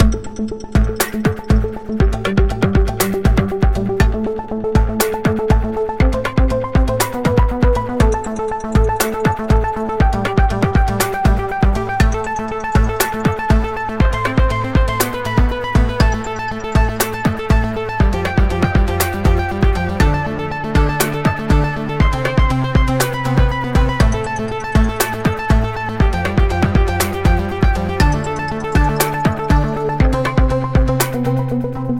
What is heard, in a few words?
Comforting Beat